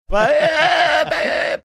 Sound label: making some funny sounds